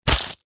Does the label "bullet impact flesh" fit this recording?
Yes